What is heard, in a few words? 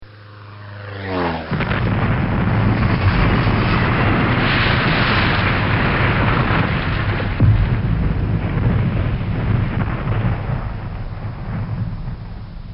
Single Engine Plane Crash; Crashes; Objects